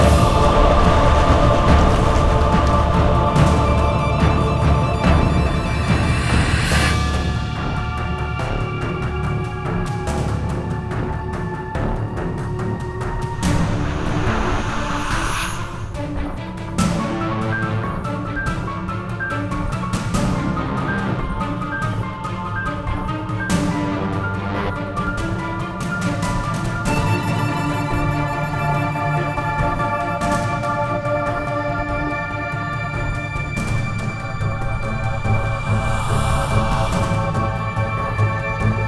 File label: Battle Lp; doodle devil android ios ps4 2012; Mus Doodle Devil